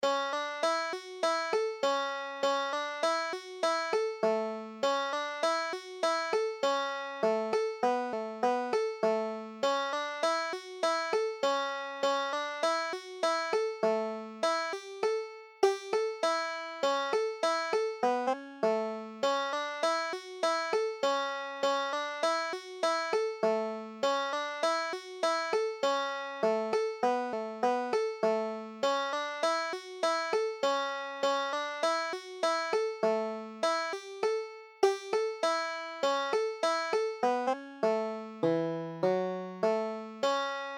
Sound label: breaking up christmas, banjo